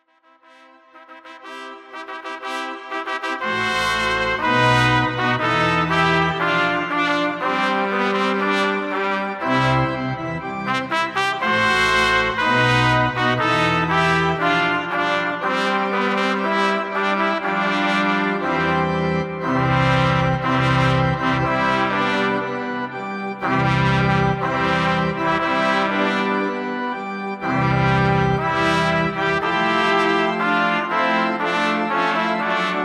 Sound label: wedding march org